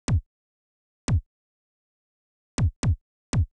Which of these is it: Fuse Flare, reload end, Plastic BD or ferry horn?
Plastic BD